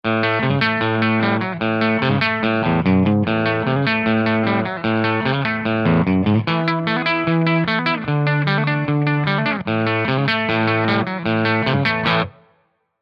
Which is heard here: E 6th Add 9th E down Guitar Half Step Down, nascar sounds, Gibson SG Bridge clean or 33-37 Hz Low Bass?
Gibson SG Bridge clean